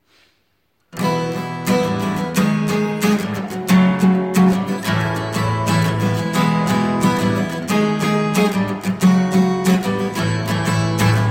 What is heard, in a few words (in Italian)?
Alleluia Beati Coloro voce principale